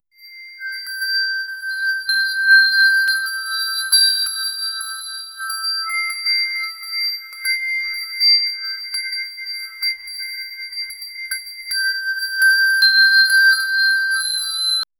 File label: bell chimes dreamy; musical